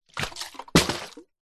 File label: bottle flip plastic water bottle throw flip land; zapsplat